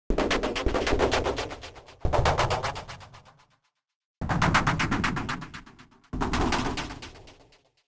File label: Atmos FX, Atmosphere & FX